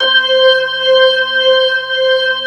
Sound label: C5, Keyboards Old School